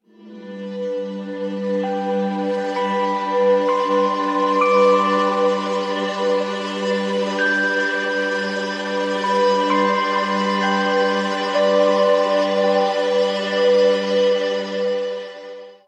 weather alarm haze; OnePlus; ui; OnePlus 11

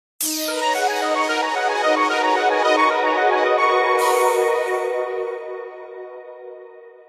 Message, Nokia; N71

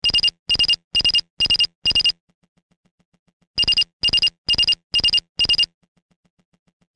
Ringtone; Infinix Race Bolt 2

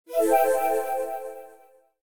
Voicemail Alert, notifications; ZTE Z2332CC

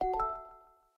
Wireless Charging Started; Itel; S23; ui

